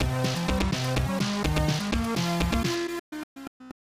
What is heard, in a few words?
Clear Level; Into Space; Corn Buster; SNES